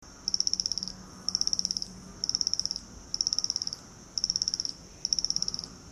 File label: Romers tree frog call